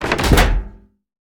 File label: put on wooden floor shield